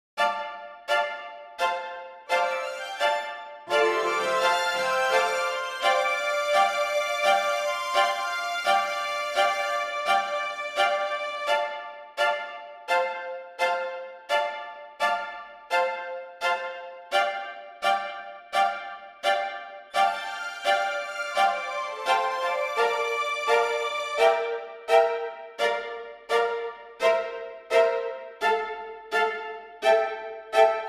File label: Strings Empire Hall; Audio Ease Altiverb XL plug-in is a convolution reverb